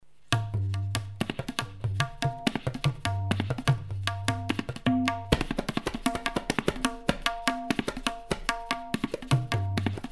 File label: Tabla solo